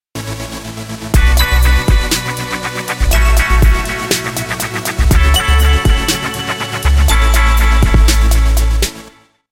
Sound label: High Energy Sting